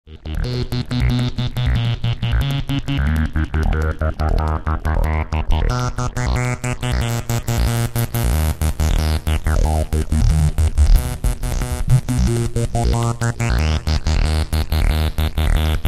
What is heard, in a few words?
cs30 filter